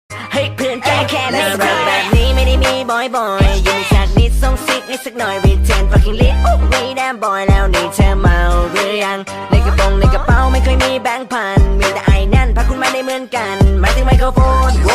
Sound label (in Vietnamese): Nhac chuong Microphone Remix